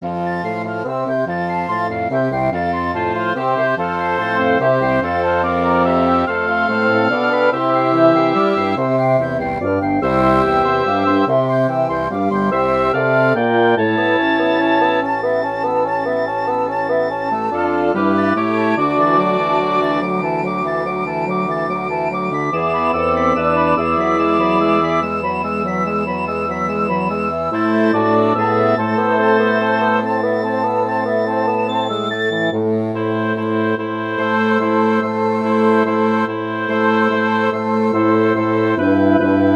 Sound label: for wind ensemble; bach johann sebastian; coro meine augen sehen stets zu dem herrn